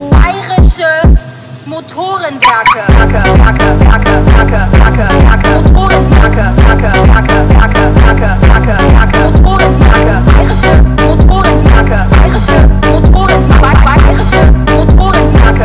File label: BMW Ringtone; Bayerische Motoren Werke